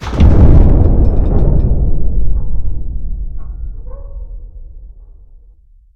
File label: explosion far